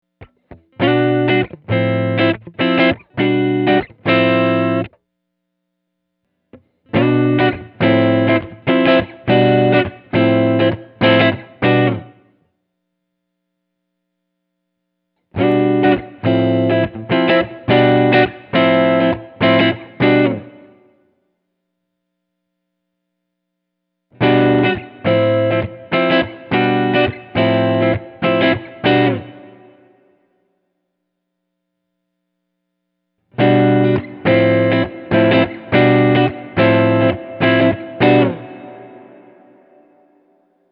room EQ, big sky; reverb; More Decay

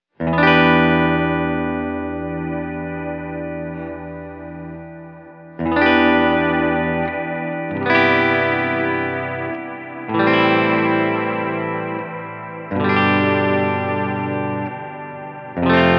Multi With Tapped Tempo

